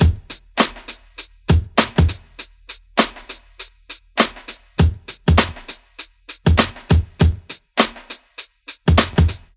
100.2 bpm drumloop, A classic boom-bap style hip-hop drum loop